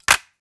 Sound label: Cassette Rattle